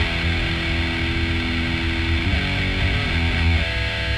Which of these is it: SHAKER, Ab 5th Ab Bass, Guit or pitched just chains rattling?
Guit